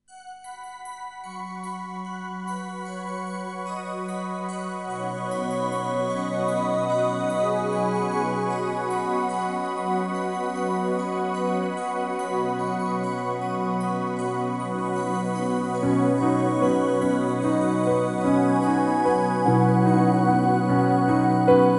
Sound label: Namo Guan Yin Bodhisattva